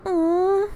Awww

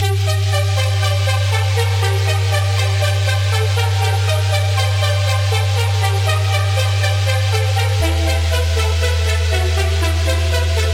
80s Movie Arp G 120

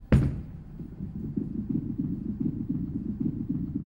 Bowlingball Loop

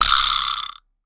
Vibraslap

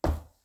Landing Softsurface

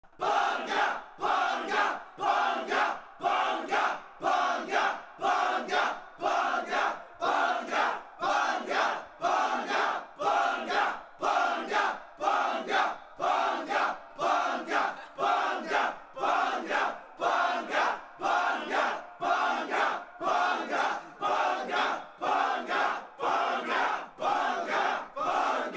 Bunga Chant

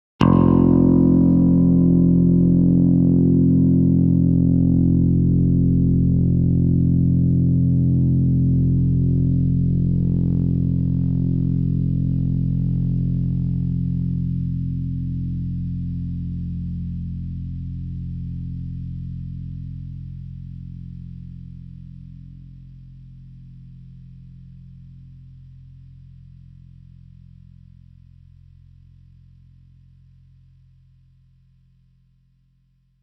F Bass Note Guitar String

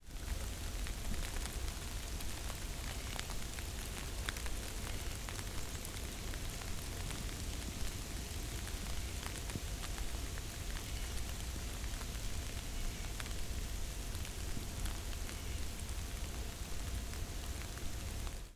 Snow Sleet On Pine

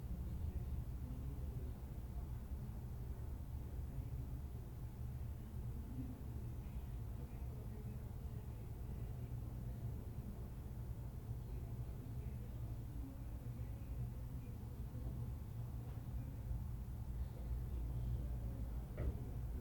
Room Tone Apartment Suburban

